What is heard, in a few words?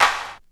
Claps; Vengeance Samples